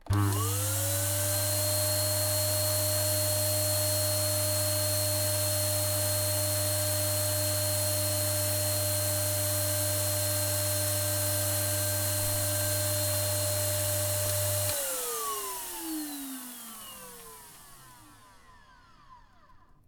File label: vacuum cleaner; devices accessories utilities; NS Studios - ultra sound collection